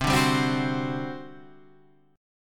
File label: G Major 7th Suspended 4th, C